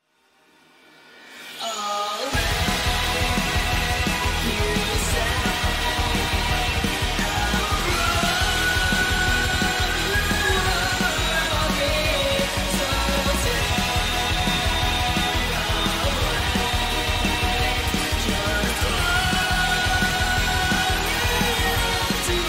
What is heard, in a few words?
Singing Reel